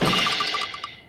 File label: whack